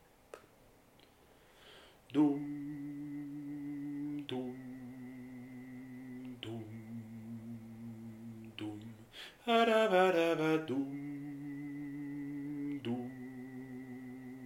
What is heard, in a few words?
basses